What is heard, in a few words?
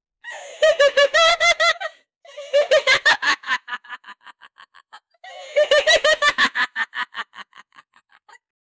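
a female mean mocking laugh; villain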